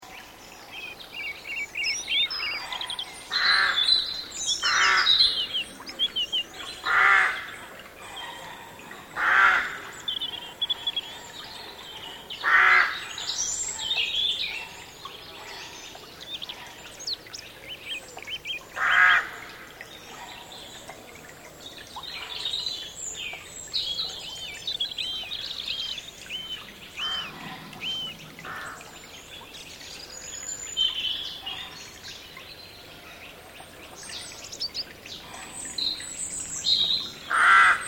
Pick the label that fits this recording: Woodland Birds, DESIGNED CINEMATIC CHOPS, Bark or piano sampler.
Woodland Birds